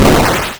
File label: SOUND EXPLOSION